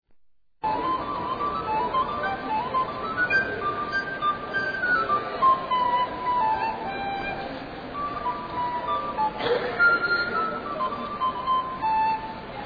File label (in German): floete; kinderstadtplan; innenstadt; marienhof 2001